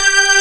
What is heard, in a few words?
JAM SYNTH, KEYS